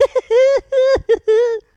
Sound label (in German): tom lachen; crazy